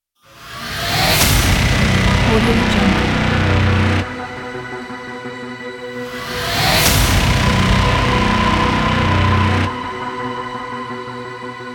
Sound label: extreme trailer